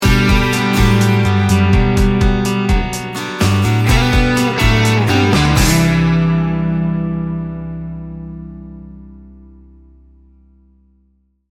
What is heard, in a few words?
americana soft rock sting bumper